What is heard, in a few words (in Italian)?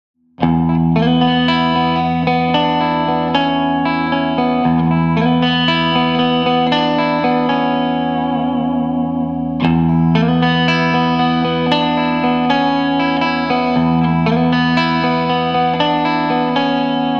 CLEAN; ik multimedia tonex pedal